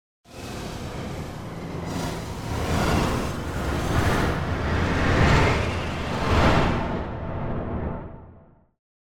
Crazy Trumpet - Rising Orchestral Cacophony - Flying; Hollywood Edge; sound designer's toolkit v2